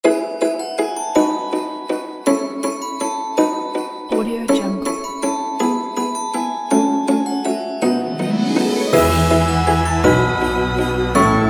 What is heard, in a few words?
christmas happiness joy logo; audio2go